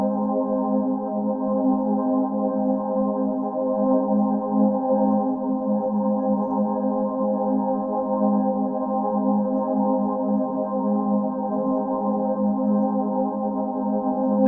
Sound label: Ambient synthesizer; Relax & Meditation Loops – Ambient Wellness Sound Pack; A calming collection of ambient loops for meditation, yoga, wellness, and relaxatio